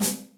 Jely Roll, Electric Snare, Yamaha DTXpress

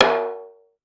Snare Edge, Pitched Down; Clavia DDrum-AT

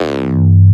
bass rubbery; Polyend; Recue

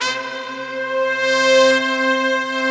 big brass, Slow Big Brass

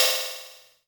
Hats, Elektron MachineDrum SPS1 MKII

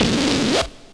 FX; AMG Megabass REMIX! VOL-2

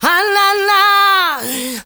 MALE SOLO, Heart of Africa

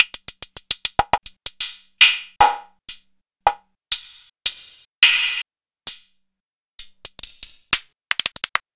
Short Hats

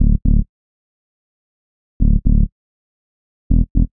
Bass Loop (120BPM); cinematic drama; Loops; musicradar

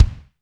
ROOM, KICKS

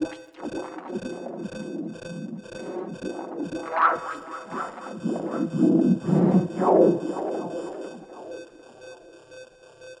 Noise, Processed Noise

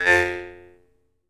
jaw harp, Pitched Percussn, Jaw Harps

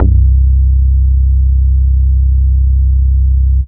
BASS SUB; Big Beat Samples & More

